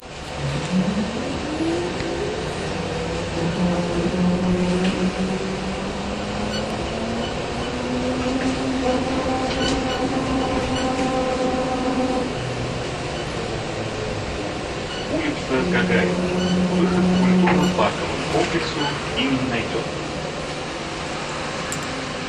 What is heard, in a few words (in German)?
Trolleybus, Murmansk; Soundmap Murmansk 2012